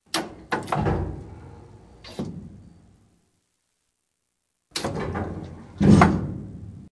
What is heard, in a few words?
main induction, pampanito